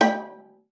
G5, VIOLA FINGER; Xsample VOL-5

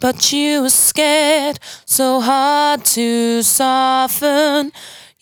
92bpm Bb, Velvet Vocal; Zero-G

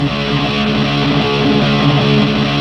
GTR; Roland - LA Composer vol. 2

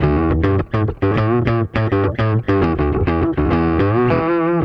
Bass

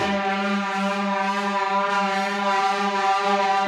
Hondo Fuzz FX 130 G, guitar drone

